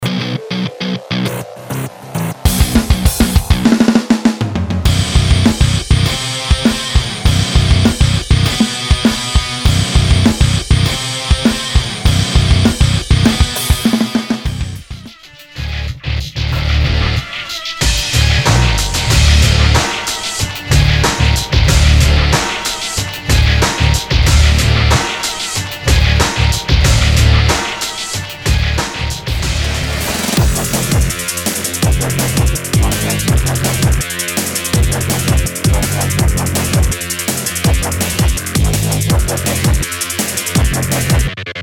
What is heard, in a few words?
Rock Metal Rocktronica mix